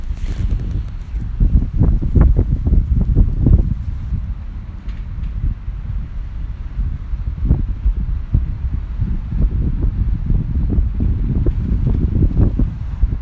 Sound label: Soundscape